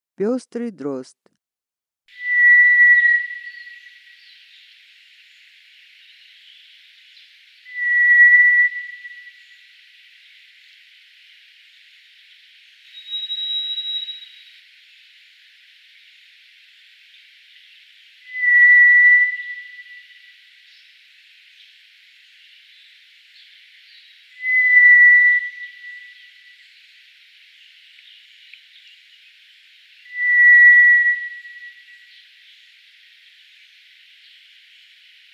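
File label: White's thrush voice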